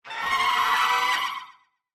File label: Giggling Minister Roar